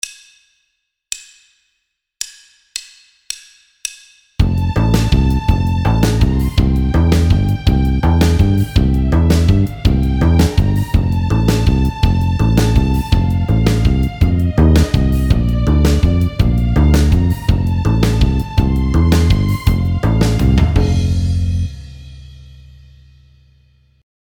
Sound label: Accompaniment Only